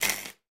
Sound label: Spark; Game rip; MiSide